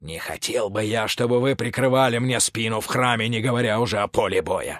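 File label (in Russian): garmon, accordion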